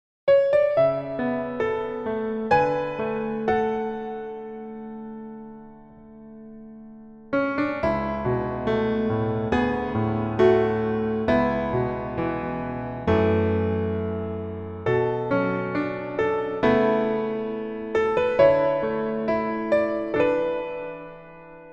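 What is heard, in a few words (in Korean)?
Piano version 멜로디 MR 남자키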